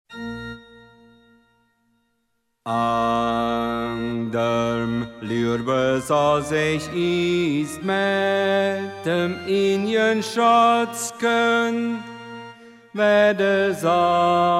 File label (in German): Bass, chor